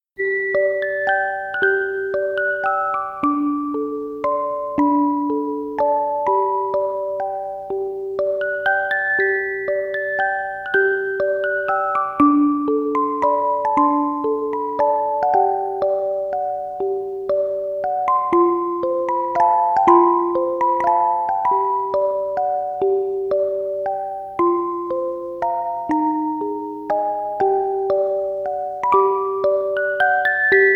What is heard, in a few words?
Music Box